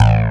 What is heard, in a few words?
FM-7II